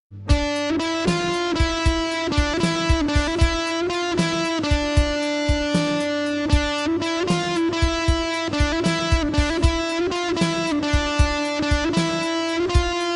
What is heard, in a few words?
digitech pro